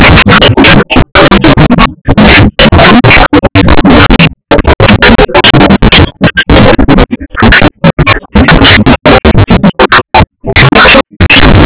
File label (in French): violon